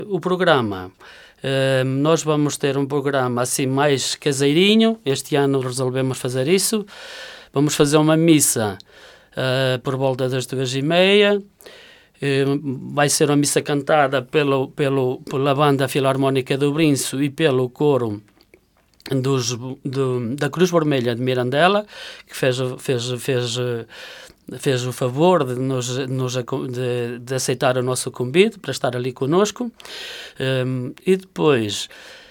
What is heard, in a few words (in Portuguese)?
banda filarmonica; Banda Filarmónica do Brinço